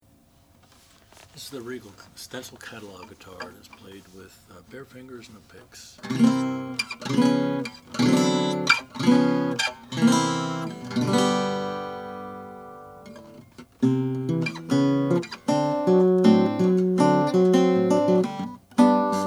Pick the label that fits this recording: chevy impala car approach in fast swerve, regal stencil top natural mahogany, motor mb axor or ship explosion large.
regal stencil top natural mahogany